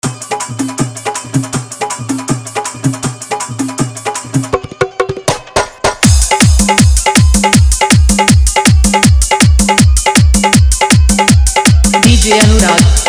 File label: Old Wedding Bhojpuri Full Dhollki Bass Dance Mix; Dj Anurag Babu Jaunpurr